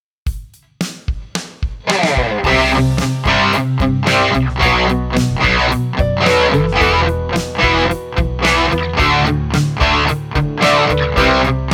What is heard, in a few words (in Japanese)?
デチューン; boss gx1